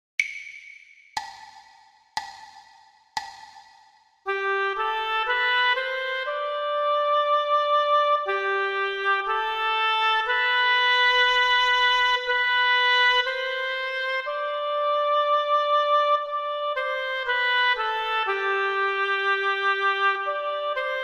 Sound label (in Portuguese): percepcao ditado